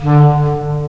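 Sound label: wind clarinet; LA Composer vol. 3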